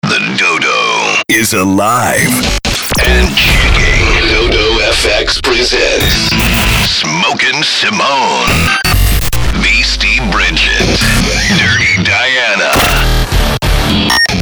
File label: rock chicks